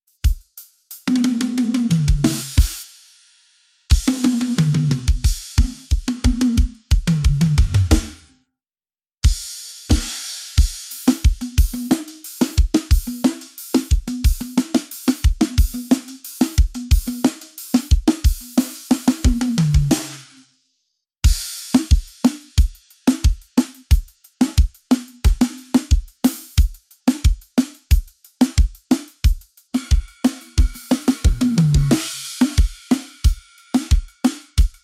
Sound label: Drums